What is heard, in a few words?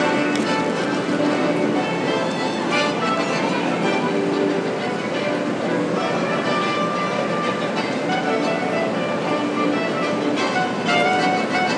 ambience street musicians at St Lawrence Market